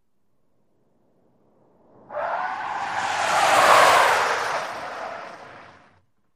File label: Sm Car Accl Skid, compact